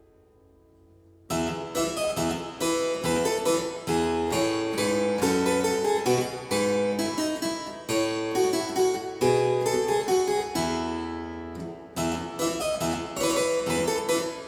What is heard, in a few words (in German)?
menuett; buttstett johann heinrich